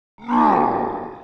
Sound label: Metal Night war idle